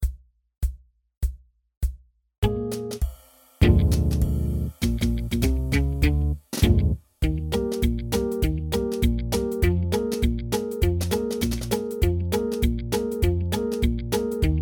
trombone

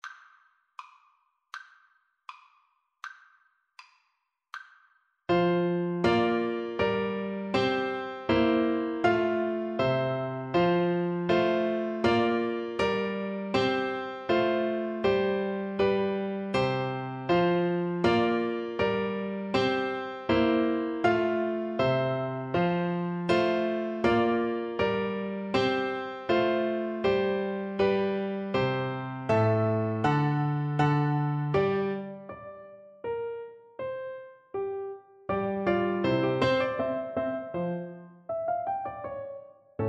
gavotte, trumpet; bach; cello suite 6

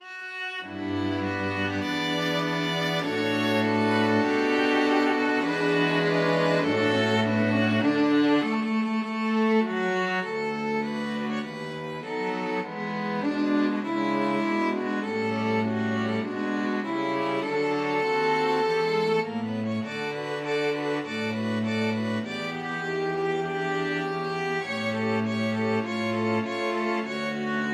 STRQ, string quartet

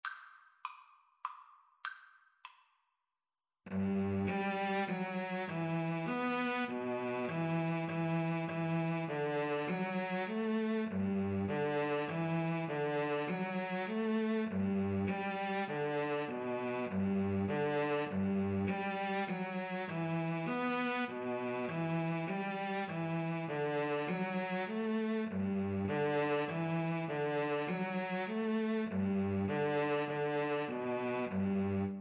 star spangled banner Viola Cello; Viola-Cello Duet

